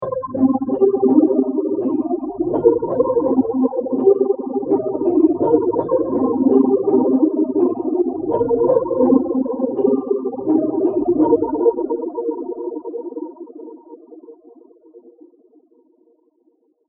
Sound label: crystalized electric piano pattern